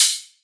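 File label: open hi-hat, Drums; One-Shots; HiHats; Ghosthack; Color Bass Essentials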